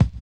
HI RIDER; Spectrasonics; Metamorphosis

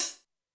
closed hihat, Zoom MRT-3B

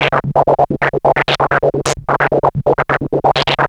Atonaloop, Industrial Analog

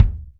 DM5 Kick, Alesis DM5 drum machine

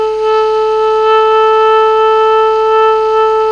TENOR PP A 4, Saxophones; Greytsounds; Philip Wolfe David Crozier's Saxophones

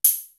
NOIS HAT; East West; Global Sounds

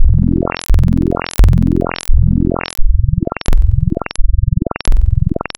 Misc Sinc, wavetables; Architecture 32

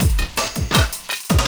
LOOP, 162 BPM, Drum & Bass

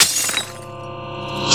BROKEN; USB Soundscan vol.33; AKAI; Disjointed Textures; BLASTER

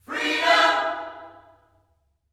FREEDOM, Gospel; Spectrasonics; Vocal Planet; GOSP PHR

